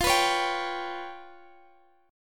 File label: C sharp 7th Flat 5th, chord